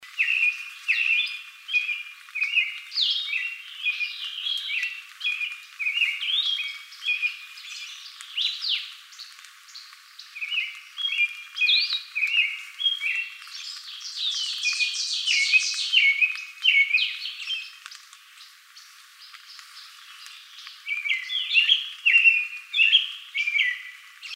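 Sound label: American Robin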